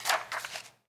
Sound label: Book Page Turn; SFX Kit; Foley - Misc